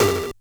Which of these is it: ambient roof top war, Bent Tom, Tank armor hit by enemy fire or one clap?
Bent Tom